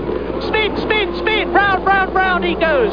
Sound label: Spin spin; BigMax nº 7; SENNA